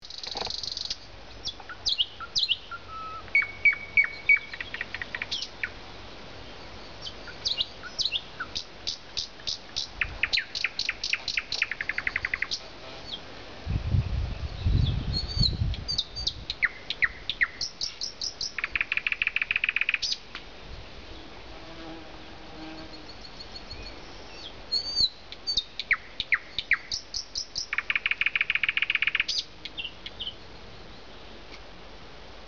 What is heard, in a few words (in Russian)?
solovey, zvuki ptic